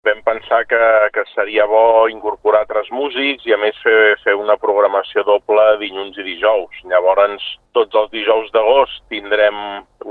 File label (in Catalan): guitarra; nova radio lloret